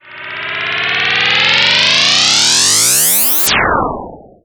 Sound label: Sweeps Up, Effects